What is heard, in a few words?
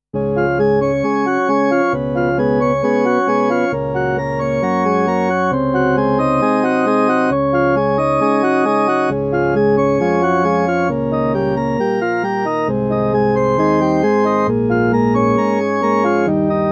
Temperament IV WTC II C Sharp Major Praeludium